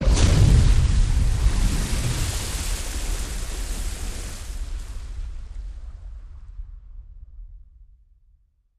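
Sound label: Explosion Water Splash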